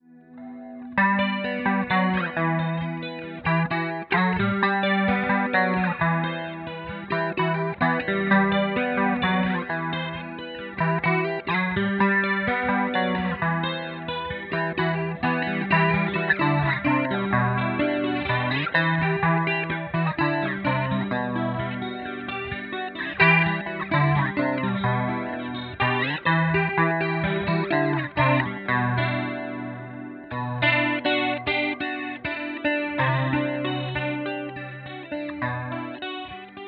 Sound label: Framus Electric Vibrato